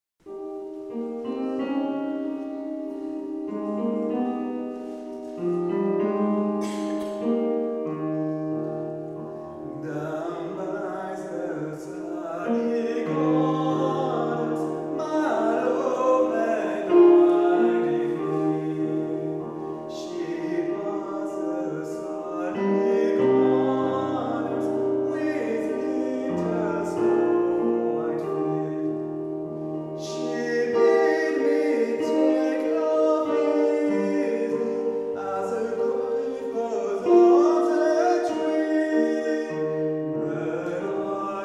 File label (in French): Chant populaire irlandais; Britten